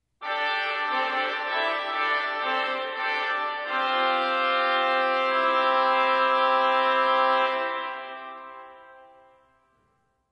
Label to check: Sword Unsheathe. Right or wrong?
Wrong